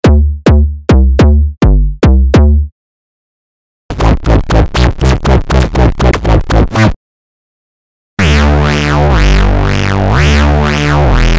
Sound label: bass